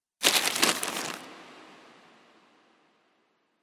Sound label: Monastry Manuscript Paper